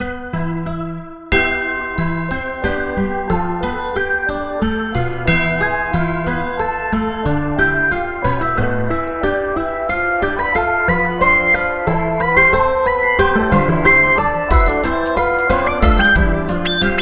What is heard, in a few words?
glockenspiel mix